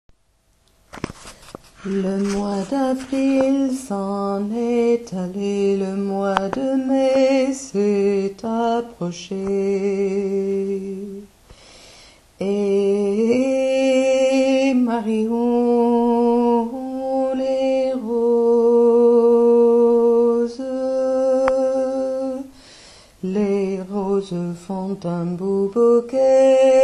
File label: alto tenor; marions les roses